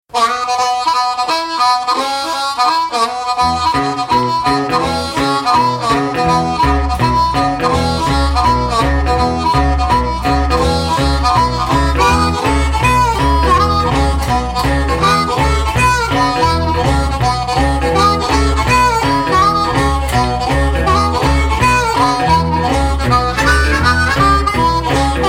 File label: Shuffle, unplugged, blues